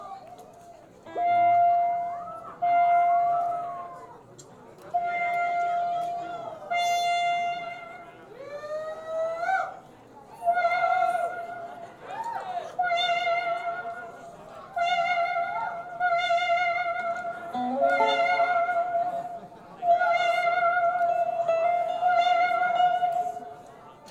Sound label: Tuning